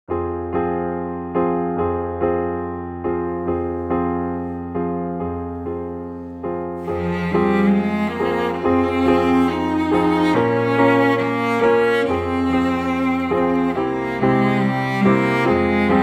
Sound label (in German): D Saite Uebung Cello Klavier